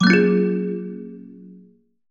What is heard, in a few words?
story invite; CosmicRage